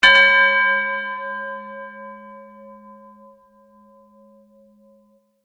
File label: Church Bell Ring, Music, Church, Bell, Ring; Bells; Musical; Blast Wave